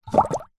water in metal container single bubble blow through plastic flexi tube; foley